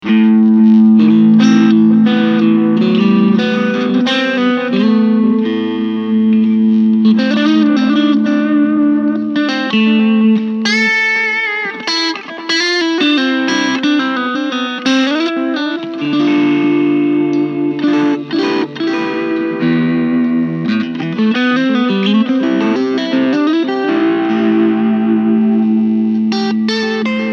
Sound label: Crunch II